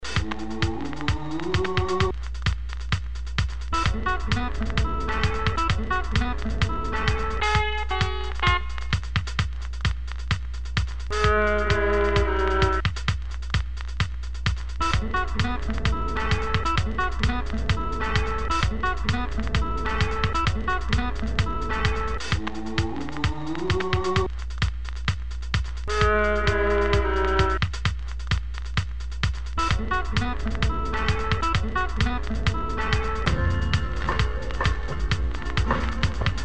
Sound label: Instrumental